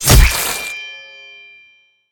charge spear hit; smite; odin

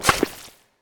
staff stab; smite; ahpuch skin10

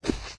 footstep soft; guanyu; smite; hades

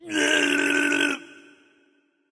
tongue; smite; thor

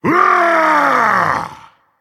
battle cry, dragon; fafnir

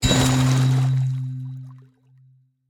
recall ohm reveal; smite; god ganesha skin11

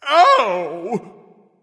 ouch, voice; Smite; ymir skin12

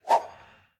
auto fire; smite; xbalanque

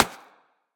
clap; smite; god sobek

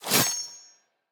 dagger slice; smite; pele

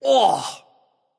clear throat; smite; voicepack; rama

